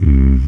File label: Unnnn; Mo' Phatt; Digital Sound Factory Mo' Phatt